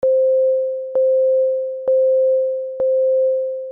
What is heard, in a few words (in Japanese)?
リリース 80